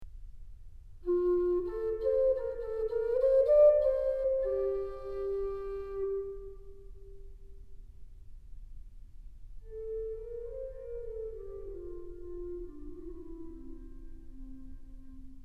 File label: gemshorn; quem pastores laudavere